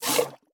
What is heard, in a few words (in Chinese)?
哞菇挤奶声